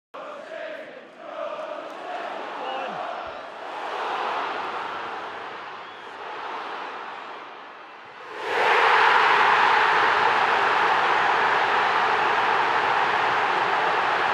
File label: goal sound effect